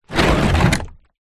industrial cannister small plastic liquid slide concrete; zapsplat